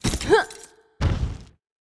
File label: Mob die; Monster; 빙검수